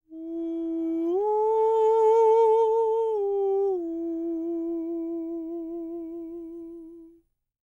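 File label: falsetto, Vocal Planet Jazz FX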